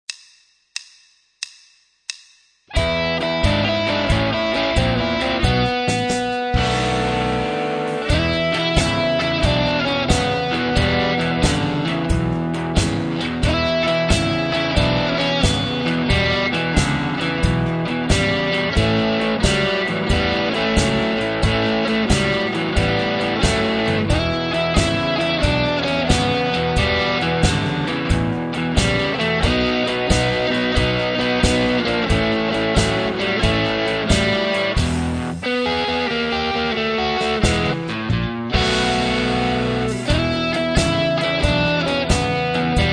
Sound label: Rock Tune, Rock & Blues Solos; 10 Rock & Blues Solos; De Haske